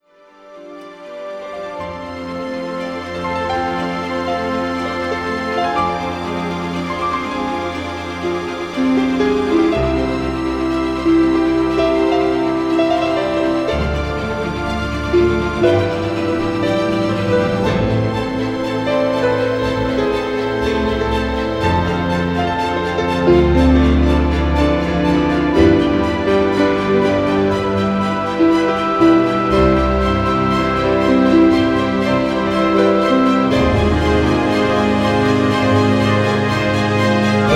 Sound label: ARIA orchestra